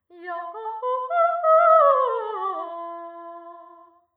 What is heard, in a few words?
operatic